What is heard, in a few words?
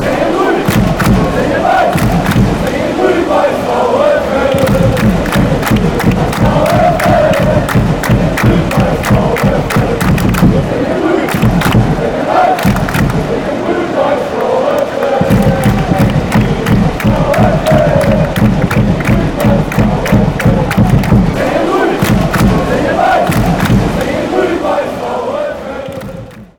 green fanchants